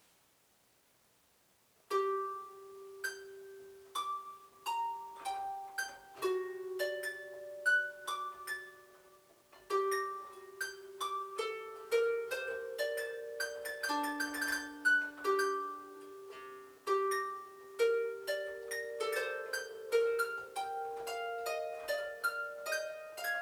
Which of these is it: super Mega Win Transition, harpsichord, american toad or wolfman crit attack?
harpsichord